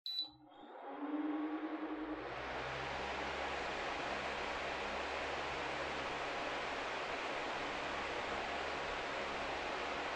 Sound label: Electric Fan Far High